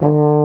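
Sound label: baritone D2 horn; InVision Interactive; Lightware VOL-1; Instruments & Percussions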